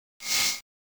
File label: propulsion